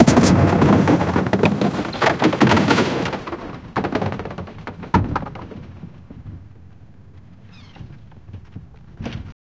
the loud explosion of a; chaos

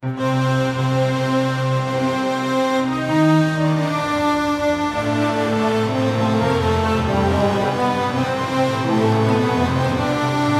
turkish drama background, oud

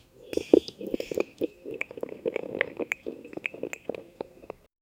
cute eevee noises sipping

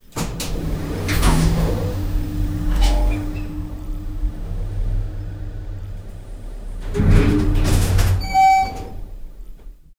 elevator

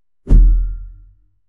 thump sound when the spot, lighting

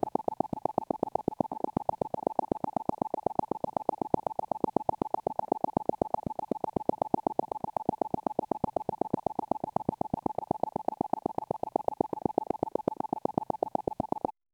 a soft rhythmic clicking, haptics

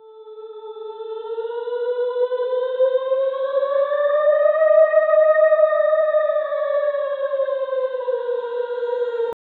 emotional and divine

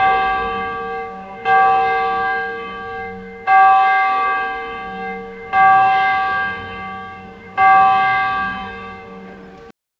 dense church bells

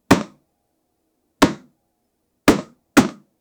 a loud sound of hitting, impacts

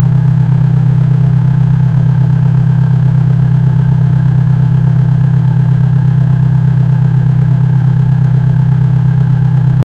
engine sound of sports car

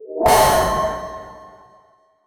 completing a task sound

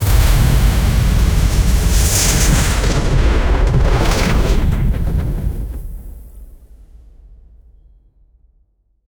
frost spell attack skill, spell

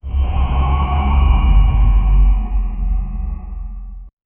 game sound mythic loot, angelic choir

